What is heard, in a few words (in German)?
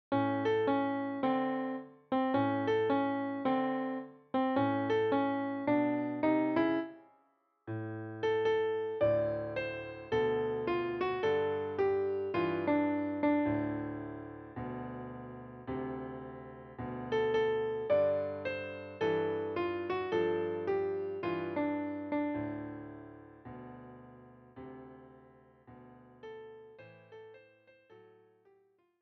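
Klavier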